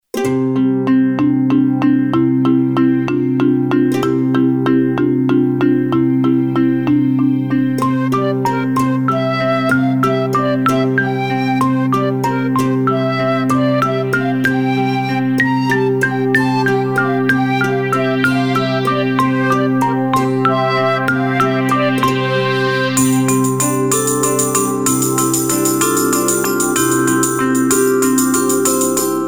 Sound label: make a mosaic backing; romans; romans songs